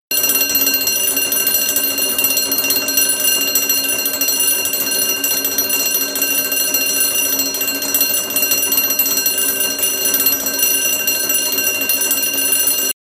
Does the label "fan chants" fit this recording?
No